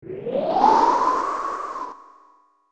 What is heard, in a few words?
Tornado Throw